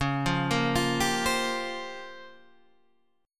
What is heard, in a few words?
A sharp Minor 6th Add 9th C sharp, Guitar chord